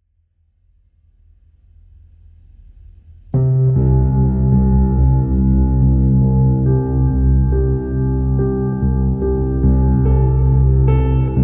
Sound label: Rare Piano